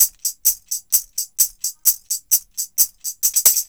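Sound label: TAMB, tambourine; Drumdrops In Dub VOL-1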